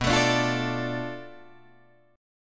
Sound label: B Minor 7th Flat 5th B Guitar Drop D